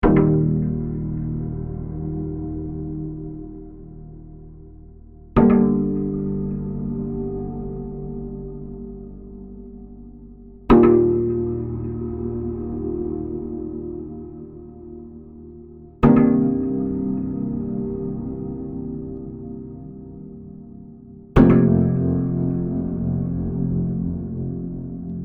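ROUGH BASS STRINGS, A combination of the viola da gamba and a homemade bass called Bastard. Everything sounds rougher, rawer and dirtier.; CINEMATIQUE; KLANG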